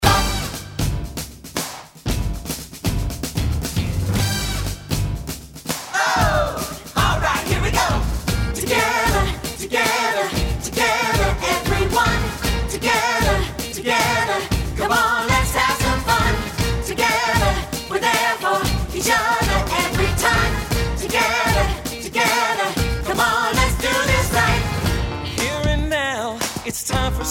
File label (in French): vocal